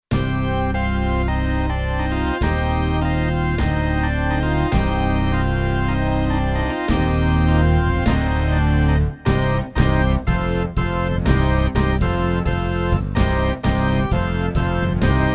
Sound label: Rock Quartet, Kurzweil K2500X; Factory Programs